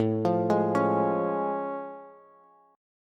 A Augmented Major 7th A Bass; arpeggiated; JGuitar

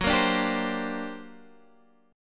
G Minor Major 11th, F sharp

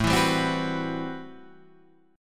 A Minor 13th, Guitar, Standard; Am13 strummed; 5,7,5,5,7,7

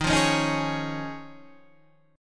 D sharp 7th Suspended 2nd Suspended 4th

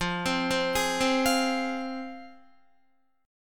F Minor F down Guitar; arpeggiated; JGuitar

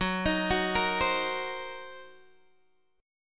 Fsharp 6th Flat 5th down Guitar DADGAD

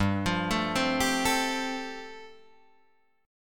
G 7th Suspended 2nd Suspended 4th, Guitar Drop D

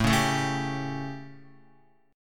A 7th Guitar, chord; strummed; JGuitar

